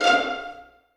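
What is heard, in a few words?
1st violins pizzicato E5; Sonatina Symphonic Orchestra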